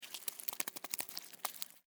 Insect Move Slimy, Insect, Movement, Slimy, Wet, Shake, Bug; Sound Effects:Animals:Insects; Blastwave